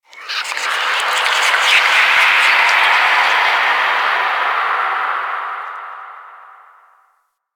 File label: Moon Whispers Small, Ambience; Warframe; Orokin Moon